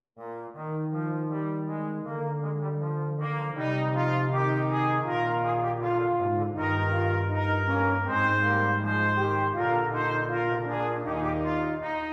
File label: 5tet; goldberg variations